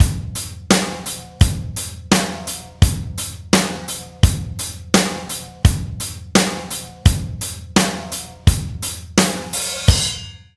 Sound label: 85 bpm drum beat g key; drum loop